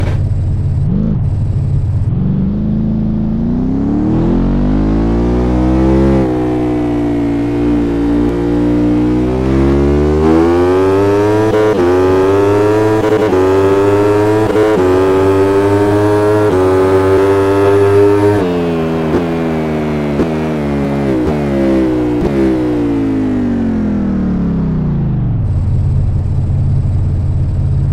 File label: panigale v2; wssp24 sound remaster